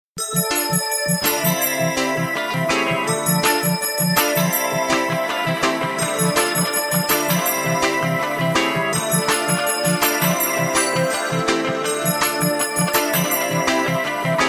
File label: bongo reggae